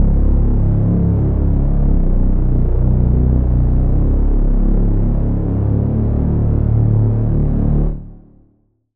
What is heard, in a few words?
Reese; Routed Sound; Serum 2 Expansion - Noble Gangster Vol.1